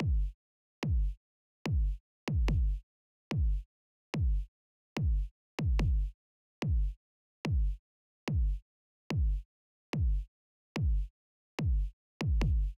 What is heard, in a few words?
drums